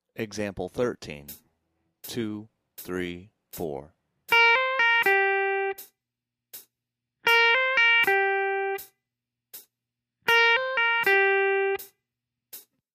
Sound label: slurs, blues guitar